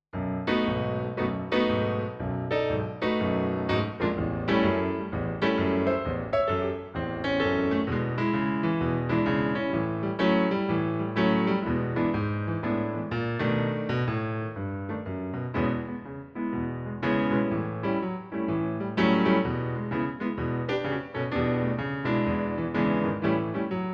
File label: blues backing